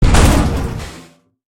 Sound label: Collision Maximum; Lethal Company